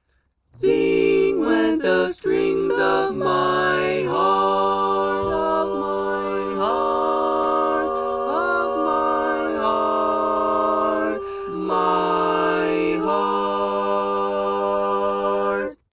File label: Tenor, Barbershop